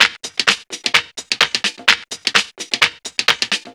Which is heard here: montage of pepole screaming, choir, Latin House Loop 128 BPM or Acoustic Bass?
Latin House Loop 128 BPM